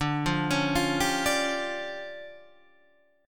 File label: D Minor Major 7th Double Flat 5th, D